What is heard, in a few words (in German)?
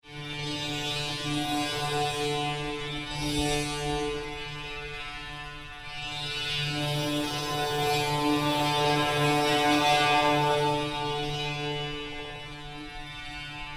Saitenfeld Ahorn